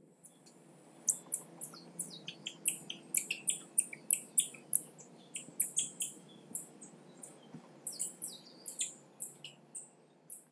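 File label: Japanese Squirrel; hondorisu; hyogo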